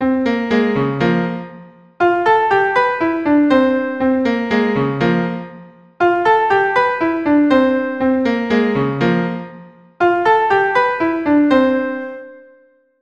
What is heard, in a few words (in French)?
création carillon